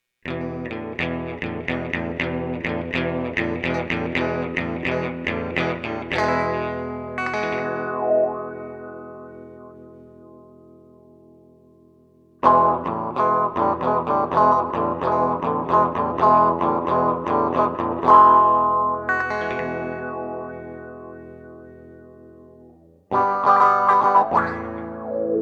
electric; MegaEpix

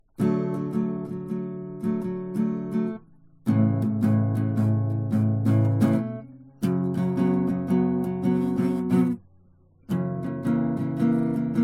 guitar; MegaEpix

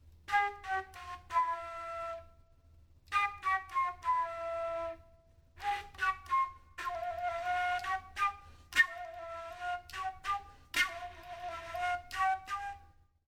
flute interlude

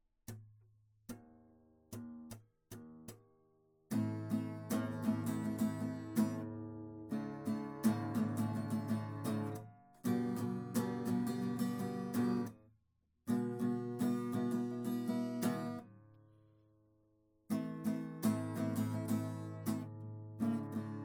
guitar

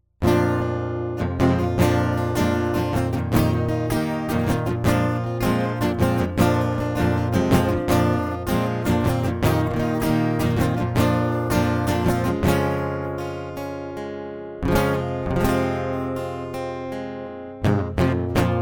acoustic

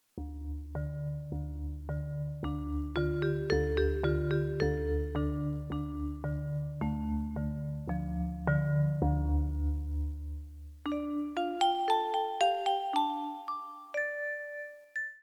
vibes

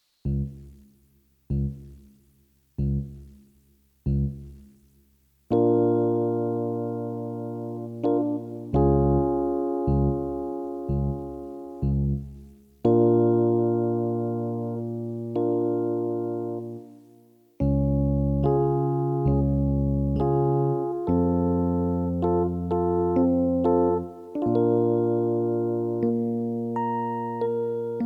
piano prelude

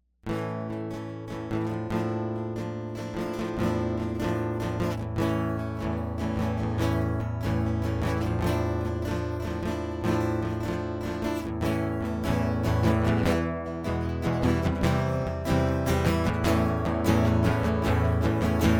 guitar prelude

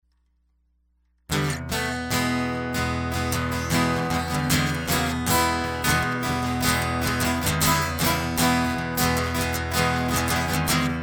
Guitar

